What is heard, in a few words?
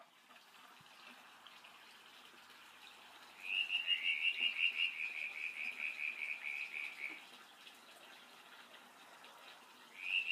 Frog Call